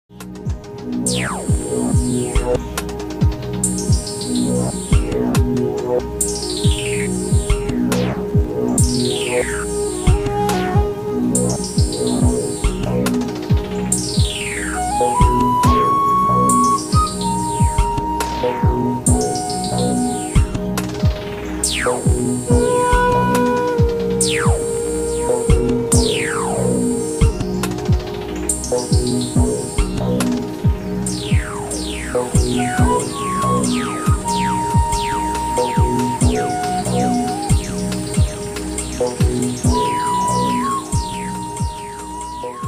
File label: circle echoes